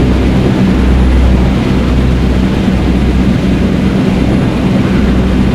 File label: engine tvd cockpit l, engines; War Thunder